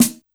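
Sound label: E2 Marching Snare, Yamaha PSR-E323 Symphony Kit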